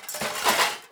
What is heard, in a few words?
item metal scrap pickup